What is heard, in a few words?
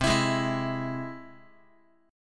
C Augmented down Guitar; strummed; JGuitar